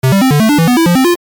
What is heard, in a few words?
arcade game level up tone